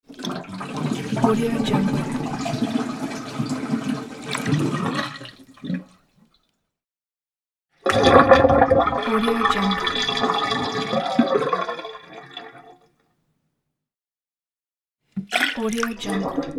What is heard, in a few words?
water down the drain